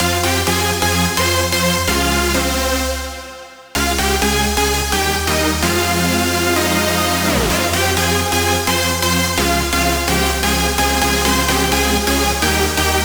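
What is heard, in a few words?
Super Saw Loop - 128 BPM F minor; House; Synths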